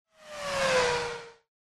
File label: Racing Pass By; SNES